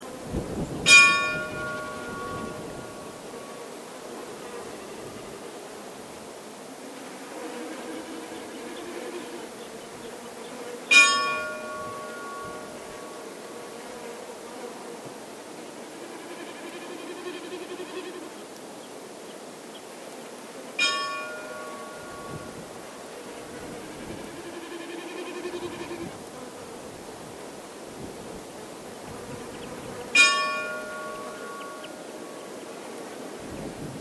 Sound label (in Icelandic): vatnsfjardarkirkja likhringing, kirkjuklukkur